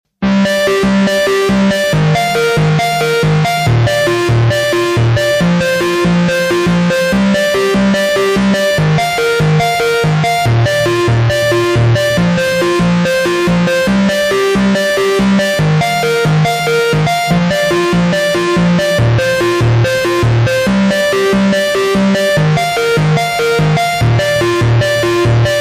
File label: VERY HARD SYNC